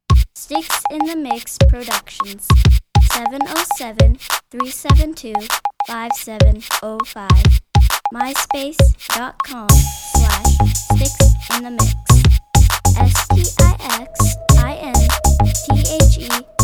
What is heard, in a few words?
break dance